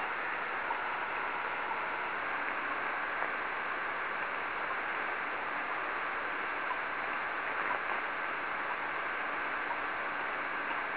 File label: cosmos ssb